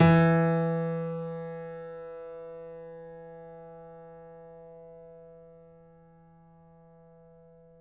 piano; East West; The Ultimate Piano Collection

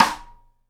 SIDE STICK, Live Snares

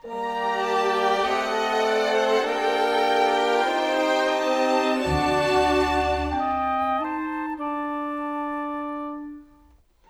Orchestra; Sonic Foundry (Sony Creative Software); Orchestral VOL-2; Modern

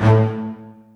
string; Techno Trance Essentials

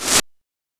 Reverse Cymbal; Genesis

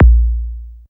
KICK deep, Techno Trance DRUMS; Techno Trance Essentials

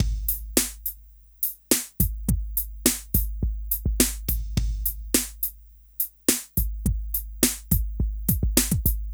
Smooth, R&B Drums

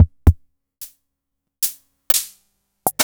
Video-Tech Rythmic10

